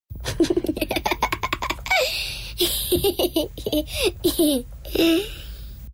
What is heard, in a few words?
Child laughing